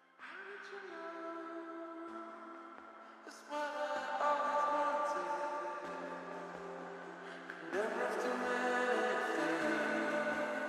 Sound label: looped reverb